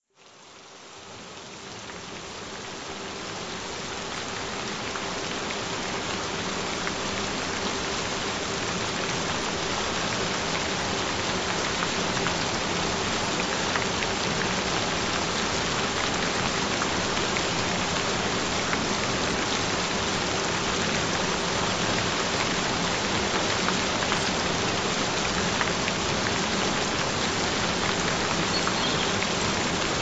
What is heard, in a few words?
Gentle Rain Sounds; Get Perfect Flawless Pale White Skin